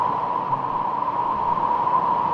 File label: Wind Speed fast